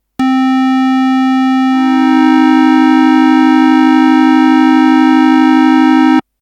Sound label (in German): kleine terz ot; harmonie